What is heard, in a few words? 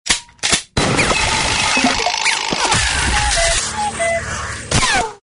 comedy trick shot